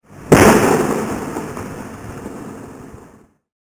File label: Firework